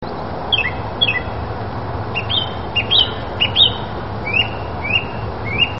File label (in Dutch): zanglijster; vogelsflevopark